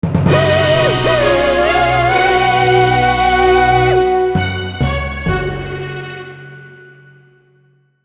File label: Jingle Time Piece Get